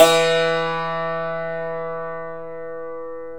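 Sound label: hammered dulcimer; Stereo Dulcimer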